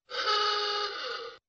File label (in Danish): bilhorn